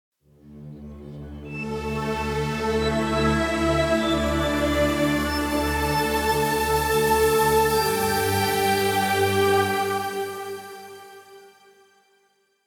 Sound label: Fresh Air Background Music